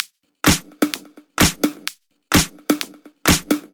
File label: Loop, 128 BPM